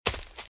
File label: Footsteps - Leaves - Run - Right; RadwinIsland